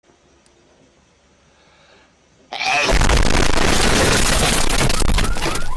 sneeze boom

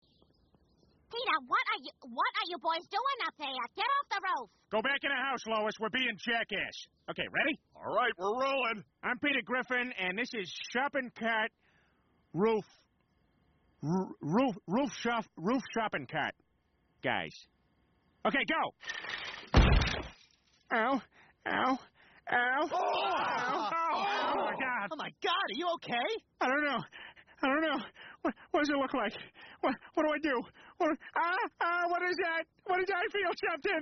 shopping cart

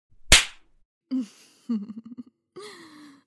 slap laugh

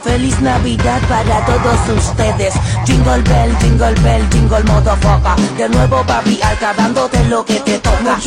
jingle bell

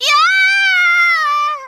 screams; tron bonne

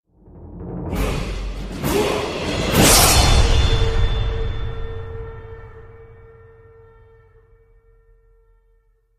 level up sound effect; skyrim

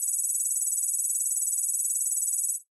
text scroll

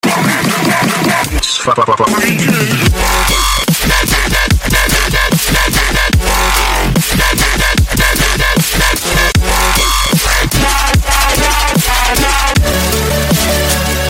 launchpad pro cover; nanomake

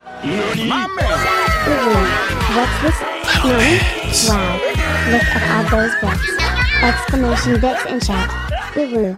bit alert

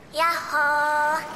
ya hoo

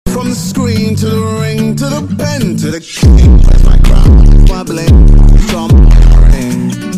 bass boosted

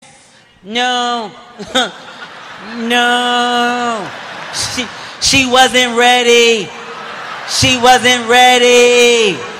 laugh at my pain; kevin hart; funeral

